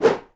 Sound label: Axe Swoosh; Civ4 warlords